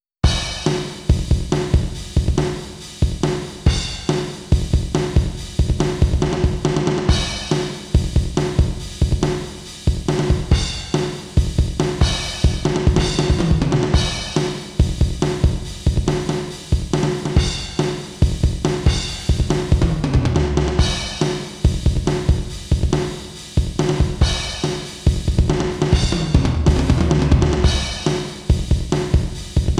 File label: 8Beat; Round Trip RV